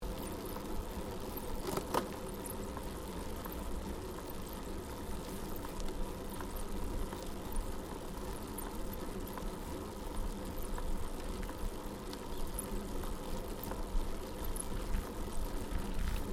Bicycle Riding Smooth Bitumen Pedal Slow Uphill Stereo, 21 speed road bicycle; Giant Crx3